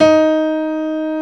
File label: BOSENDOR D#4; East West - Global Sounds